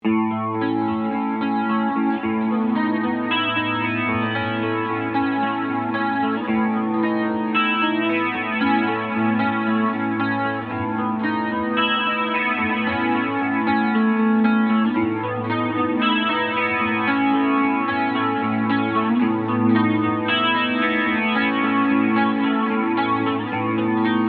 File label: guitar